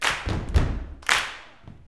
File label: 108 CLP FT, Vocal Blues & Soul CLAP FEET